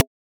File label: Alien FM Perc Short